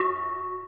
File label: Arp; osu